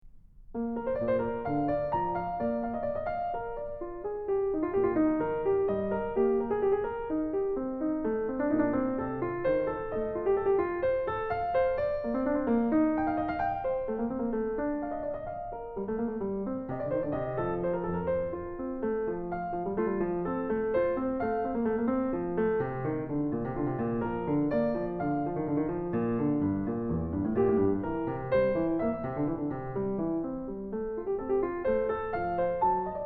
Invention In B-Flat Major; Magrath Book 09

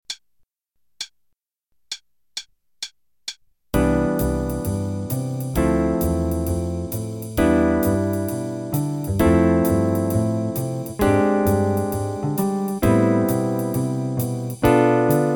Hanon Jazz piano, qn=132

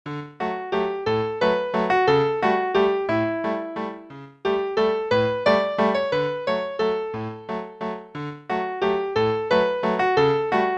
Play-along Italian Folk Song (en=180), piano; Tchaikovsky

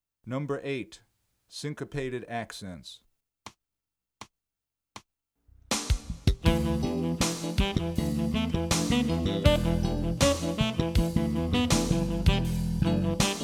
Syncopated Accents; 14 Jazz and Funk Etudes; CM Level 6 Solos (jazz)

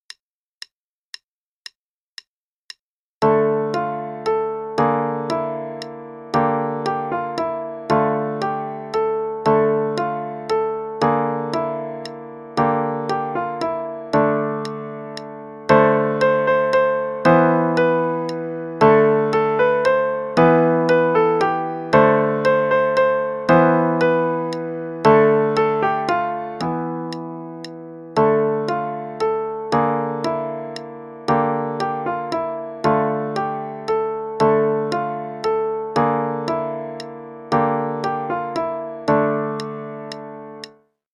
Arabian Dance, qn=116; Brown

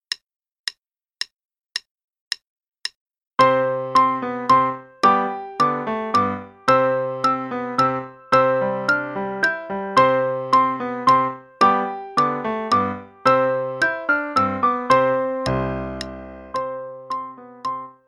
English Minuet (both parts, qn=110); Faber Adult Accerlated; Adult Accel Level 1

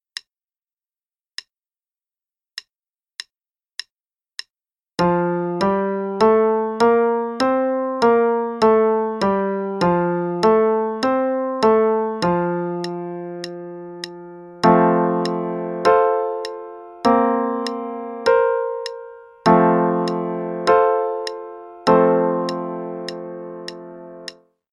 F Major (qn=100); Level 1 - 2nd Ed

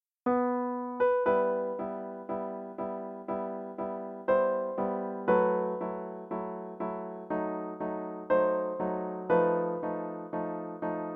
Prelude, no click qn=60; Chopin; Op 28 No 4

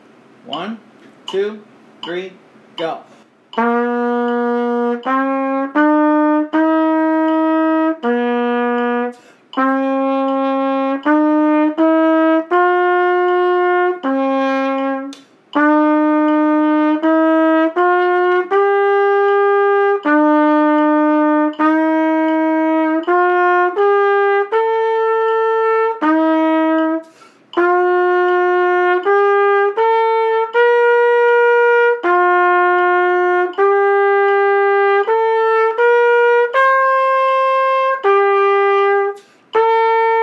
Play-along, qn=80; Rubank Elementary Lesson 06; trumpet

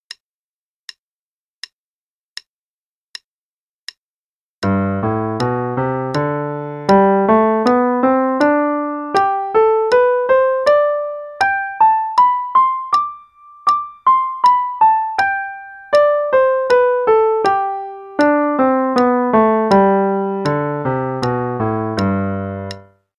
Major Pentascale Study, in G (qn=80); Adult Level 1

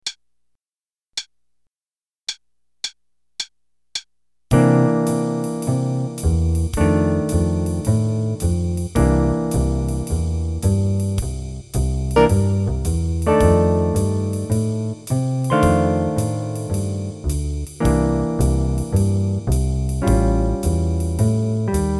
Play a long Blues in C qn=108 w gtr; 4 choruses w/guitar; Jazz Improv Play-a-Long

